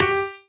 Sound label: piano melo